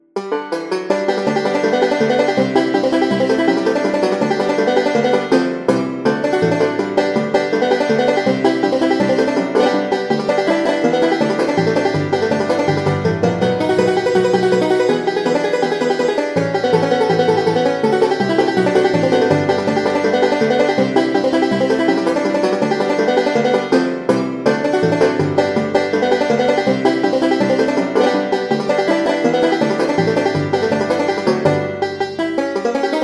5 String Banjo; Banjodoline; Syntheway

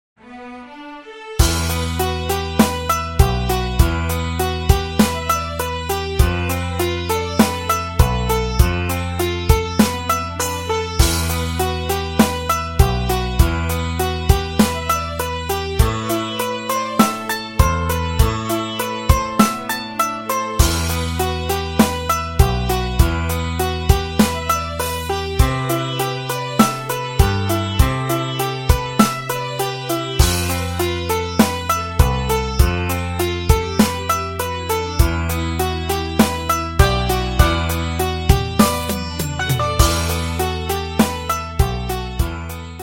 Sitar Model 1 Monaural; Sitargen; syntheway

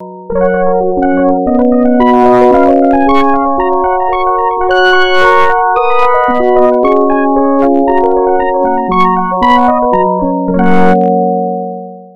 KEYS Resonant Daydream; SpheratorFM

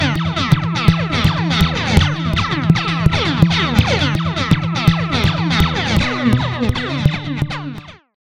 Stereo Flanger Ray Gun Echo Whoosh Guitar Wet; Sonicircuit; Syntheway

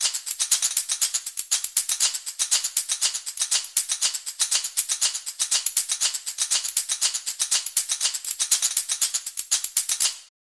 Afro South American Caxixi; Drumwavy; Syntheway

